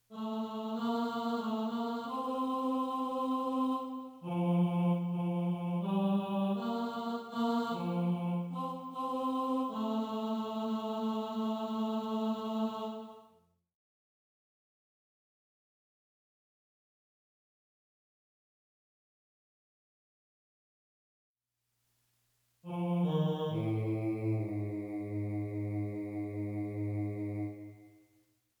bass

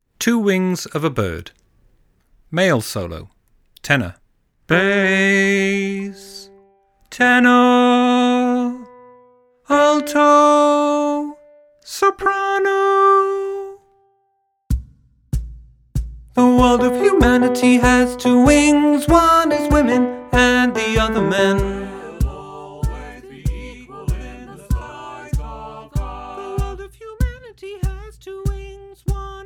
solo (male), choral music

